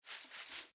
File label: Grass; Blind Quest - The Frost Demon